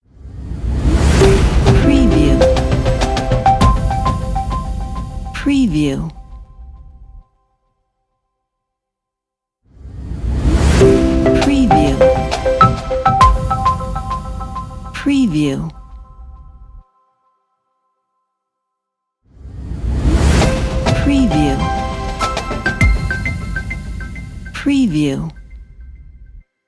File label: logo ident